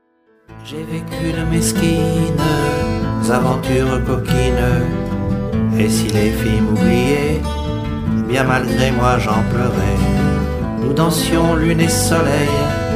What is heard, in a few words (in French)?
Chant